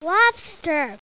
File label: LOBSTER SOUND